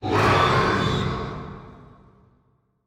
xeno spot and yell

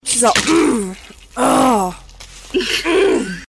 sigh

